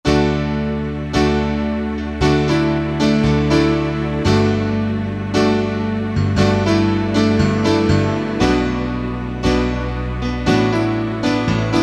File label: Country Ballad; CntryBallad1; Roland SR-JV80-17 Country Collection